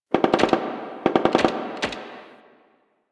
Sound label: Distant Gunfire